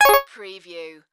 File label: Multimedia Alert